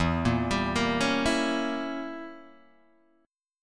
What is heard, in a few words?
E Diminished 7th E, Guitar Open G